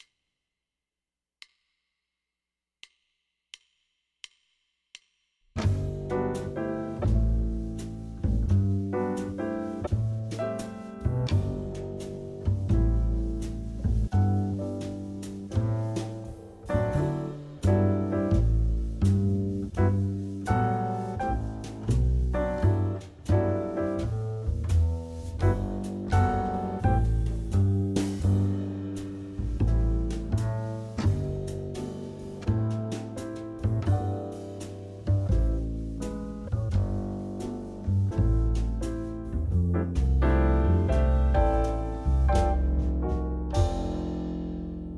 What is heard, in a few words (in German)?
g dur klingend, saxophone